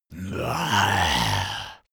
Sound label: zombie moan idle throat